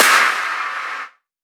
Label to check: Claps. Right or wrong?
Right